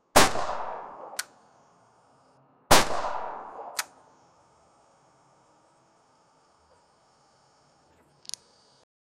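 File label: a modern pistol being shot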